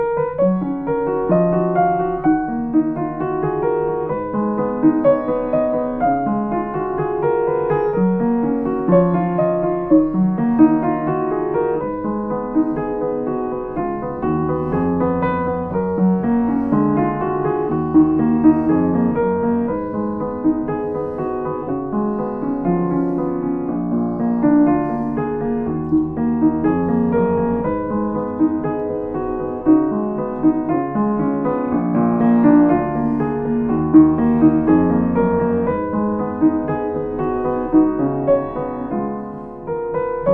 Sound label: Kemble, piano